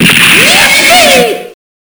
Yippie! (Coin SFX)

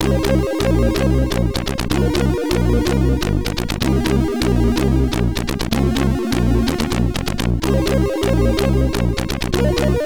Stage Load, SID Stereo, commodore 64; Rubicon

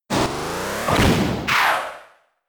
Giga Impact; attack moves; Pokemon; Gen 4; DPPL HG SS

